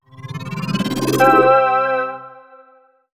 Flubber Slide; PlusFourSix; Lars Edvall

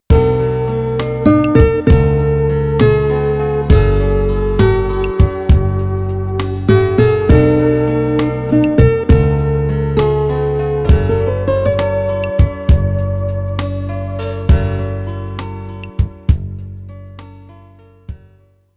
miss

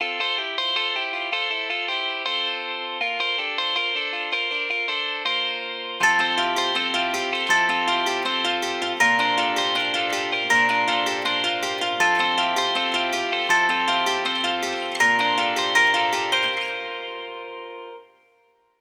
Together, ringtones

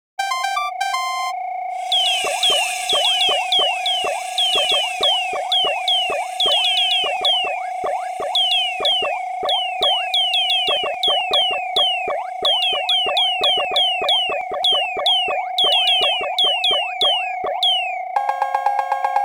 80s Arcade ringtone; Nokia; Diversion

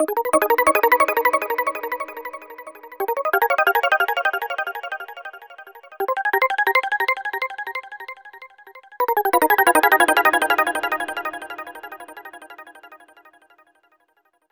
ringtones; HTC; One-M7

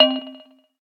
electric, keytone

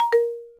Video Stop

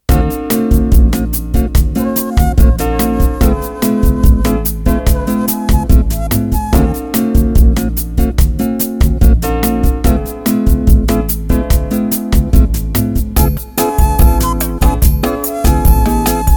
Paris romantic

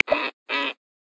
Sacred Ibis; Xiaomi Redmi-Note-8-Pro; Africa

